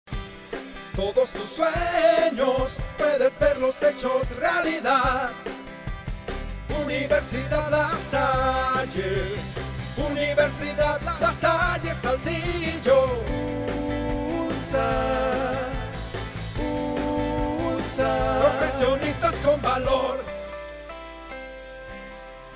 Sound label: jingle